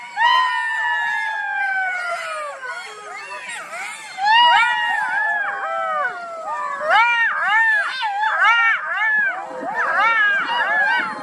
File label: Fox Noises